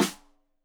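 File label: Snare Stereo, Drums; Deeply Sampled Drums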